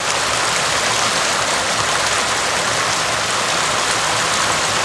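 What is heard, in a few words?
Waterfall Small Looped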